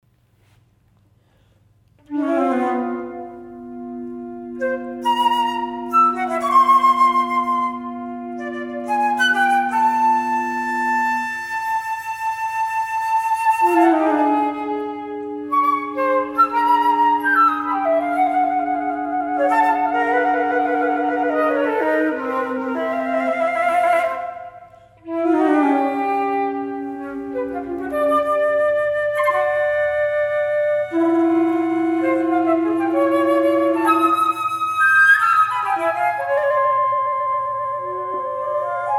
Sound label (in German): 4 Flöten; 8 Facetten